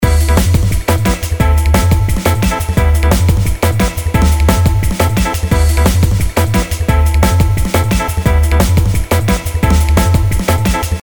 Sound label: send eq